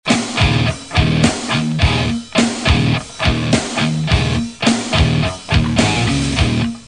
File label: depressing rock music